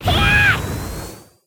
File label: super jump; sasuke